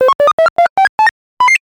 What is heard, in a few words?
Advance Next Level Pixel Game